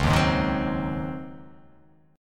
C sharp Major 7th Suspended 2nd Suspended 4th, C sharp